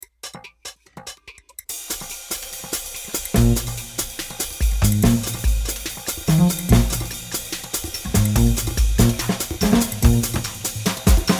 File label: Jazz